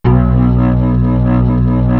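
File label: C3, major minor violin trill; East West; Global Sounds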